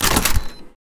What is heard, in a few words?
layers mechanical; sinematic neon source; soundmorph